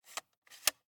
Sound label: Viewmaster, plastic, lever, load release